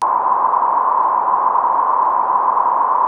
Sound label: gliding; sonic heroes